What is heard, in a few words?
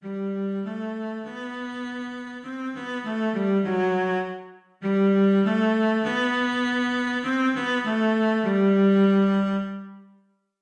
Cello Sight Reading Exercise; Brief Interlude